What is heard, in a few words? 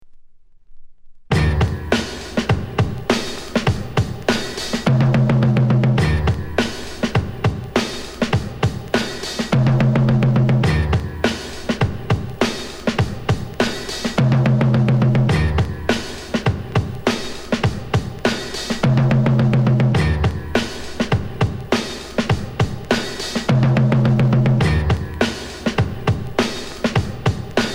beat